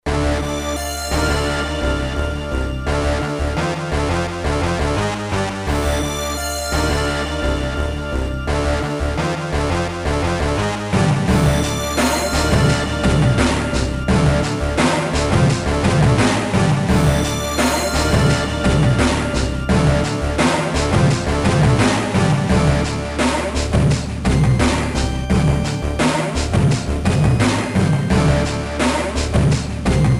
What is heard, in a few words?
music